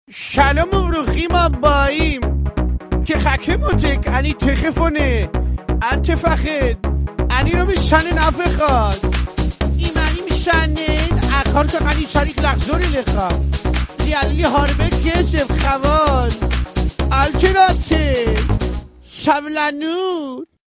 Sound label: cute phone